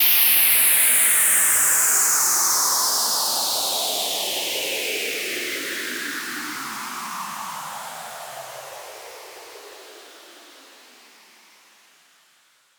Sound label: Downlifter Generic; One-Shots; SFX; Ghosthack; Color Bass Essentials